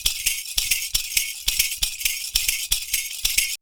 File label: PERCU; Univers Sons; Raricussions